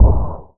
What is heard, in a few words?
weapon missile launch; spacetac